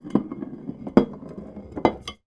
foley bottle wine roll across wood table

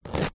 carrot slice knife; zapsplat

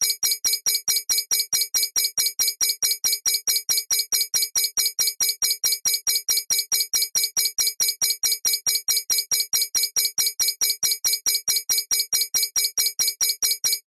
science fiction alarm warning beeping chirping

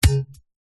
knife drop grass

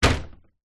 household large wooden window shutters close; zapsplat

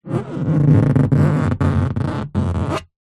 foley balloon inflated squeak rub; zapsplat

